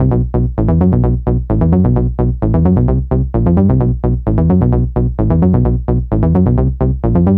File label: NRG Bass, Trance